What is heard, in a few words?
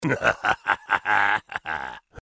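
funny orc laugh; warcraft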